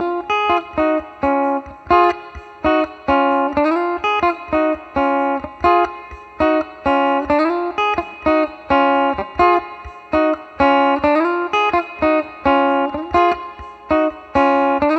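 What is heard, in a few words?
C minor Guitar, 128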